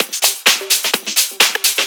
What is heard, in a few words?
Discohouse Loop 128 BPM